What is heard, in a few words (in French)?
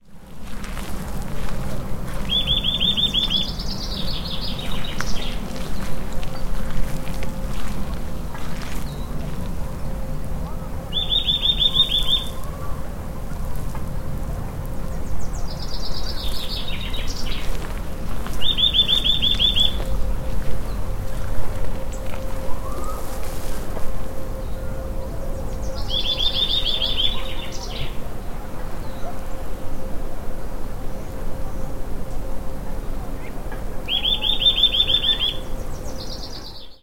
Sitelle torchepot; St Jean d Arves